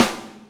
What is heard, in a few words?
sock, snares real